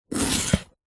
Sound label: food courgette zucchini single chop knife wooden chopping board